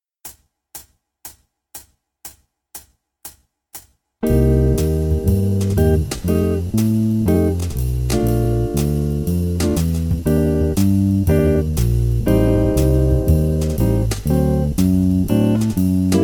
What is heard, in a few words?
(120 bpm) Backing track